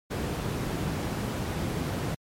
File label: Brown Noise Stereo